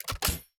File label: shotgun reload